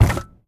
staff drop, inventory; ui

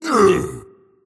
pain; dota; tiny

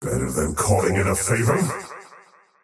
teleport pain; dota; faceless void

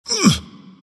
grunt; dota; ember spirit

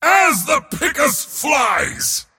move pain; dota; mars

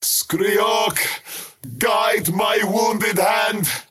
skywrath crown attack pain; skywrath mage

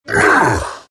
morph grunt; terrorblade

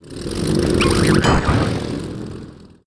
taunt barrel roll; weapons; dota; gyrocopter

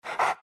pant small, greevil; npc; courier

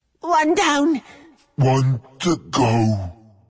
death; dota; ogre magi

